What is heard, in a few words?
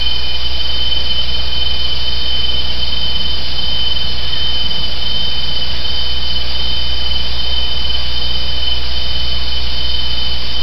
Cicada; Bukit